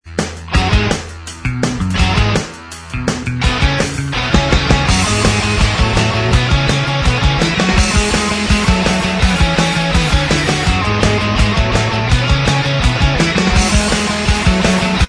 Inst

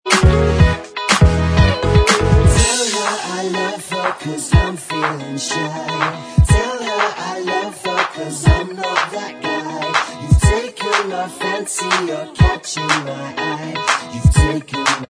Bvox Track

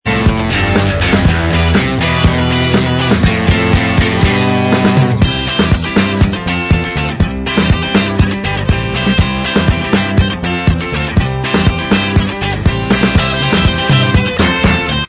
Inst Track

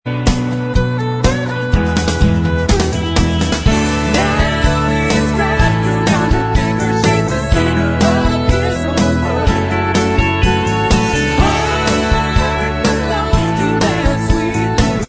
Bvox Track

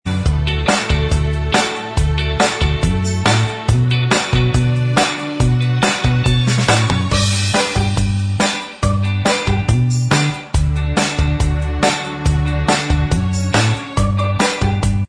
Inst Track, backing tracks

